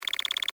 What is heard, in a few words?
Read Out Vintage, Scifi, computer; Science, Fiction; Computers; BlastWave